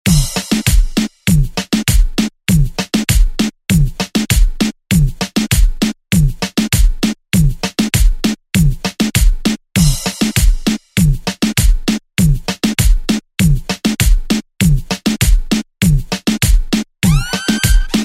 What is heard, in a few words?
Latin Reggaeton; Deejay Hit; Redrum